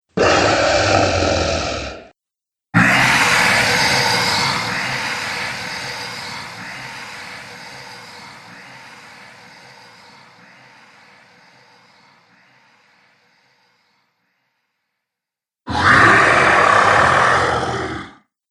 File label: Three Dragons, monster; horror